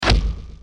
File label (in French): transperce